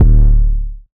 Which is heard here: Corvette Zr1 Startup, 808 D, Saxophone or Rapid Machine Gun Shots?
808 D